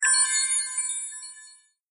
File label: blind sound; Arc of Templar